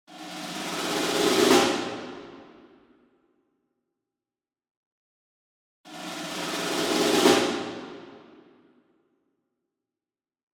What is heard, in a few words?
SINGLE SNARE Pearl Marching Roll Far; boom library; Cinematic Strikes Construction Kit